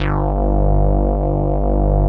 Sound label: RESO BASS; Greytsounds; Scott Peers Sound Engineering